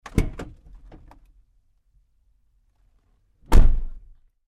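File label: Truck GMC Savana Door Interior Open Close Med; 2010 GMC Savana; Trucks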